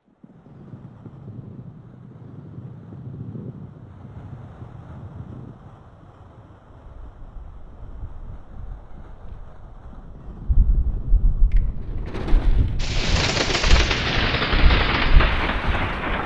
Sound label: thunder